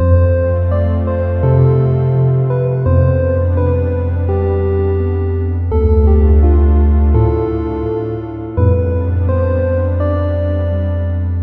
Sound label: loop, music loop; timber